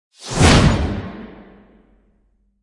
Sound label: Groosh Wipe; Ninja Tracks